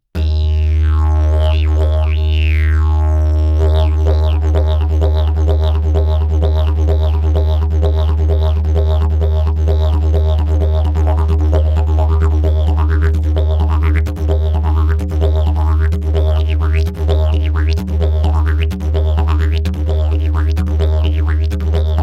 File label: didgeridoos